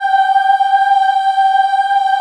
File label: G4 CHI OH -L, Choir; USB Soundscan vol.28; Acoustic & Synth; AKAI